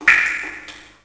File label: drip; WhiteOut